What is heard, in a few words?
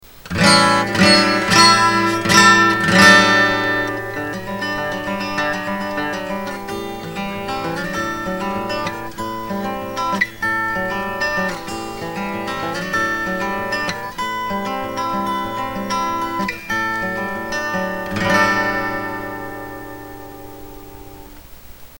guitar ringtone